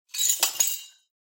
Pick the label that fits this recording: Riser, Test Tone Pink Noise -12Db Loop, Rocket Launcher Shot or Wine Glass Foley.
Wine Glass Foley